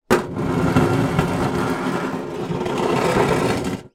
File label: small metal case tin down on concrete then slide; zapsplat